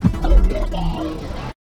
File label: punch right slow down really hard; Pitch Black - A Detective's Demise